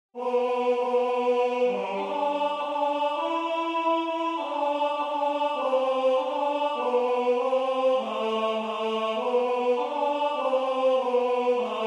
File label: Tenor; Laudate Nomen Domini